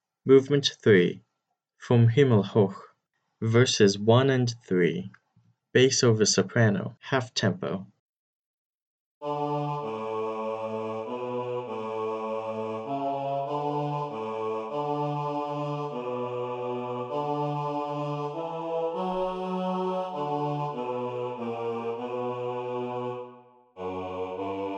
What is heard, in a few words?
bass over soprano half tempo, choir